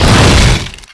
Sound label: big rock hit; WAR